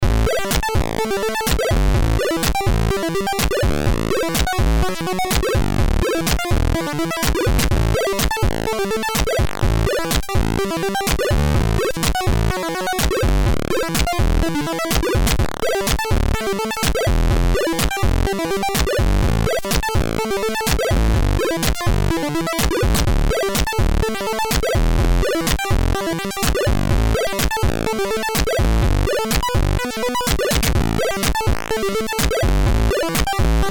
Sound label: music